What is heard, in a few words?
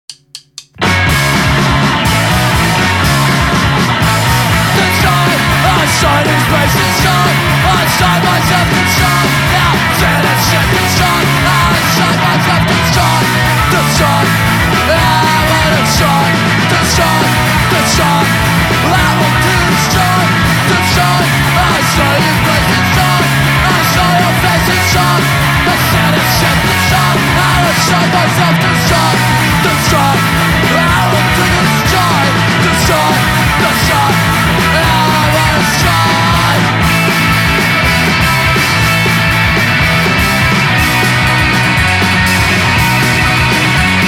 Electric Guitar Destroy